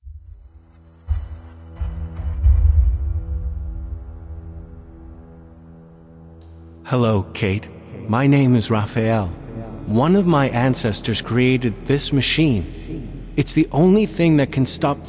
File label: Dialog; House of 1000 Doors Serpent Flame